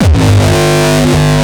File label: kick; shock. Ultimate Kicks vol. 2